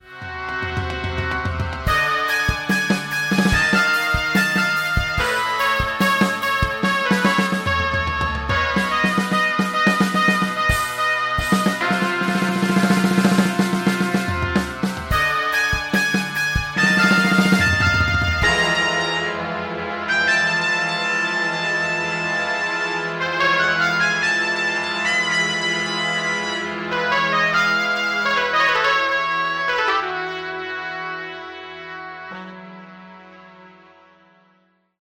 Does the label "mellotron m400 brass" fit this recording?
Yes